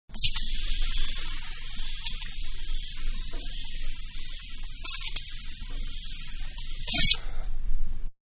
Can Spraying Foam, Can Aerosol; Spraying (Sound Effects:Objects); Manhattan Production Music

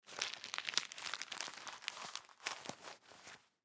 Money Bill Single Place In Pocket Dollar; Foley; Blastwave

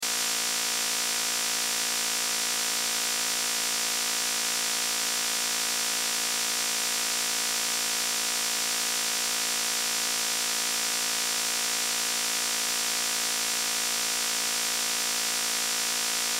Sci Fi Buzz Hum Ballast Electrical Loop; Tech; Blastwave

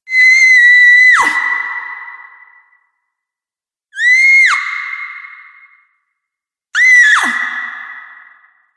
Female Scream With Reverb; Screaming

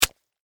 Flesh Stab Knife, Horror, Flesh, Stab, Knife; Blood and Guts

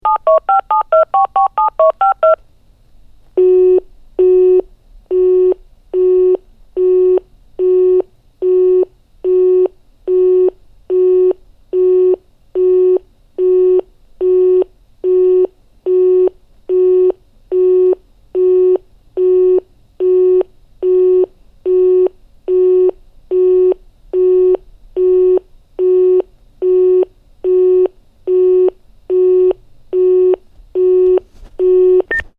Telephone Dialing Beeps and Engaged Tone; Telephone Sound Effects

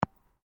Sport Ball Rubber Bounce Gravel, Rubber ball; Bounces; Objects

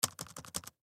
Laptop Type Backspace Multiple Keystroke; Sony Vaio Computer; Computers; Technology; Blastwave

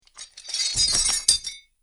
Metal Chain Sounds And Stone Movement; Chains Sound Effect - Clink, Clatter, Clang, And Clink Are The Sounds That These Chains Make.; Andrew Fleming

